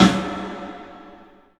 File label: PA DEEP R, CLUB SN VRB; 3D Sound; 3D Drums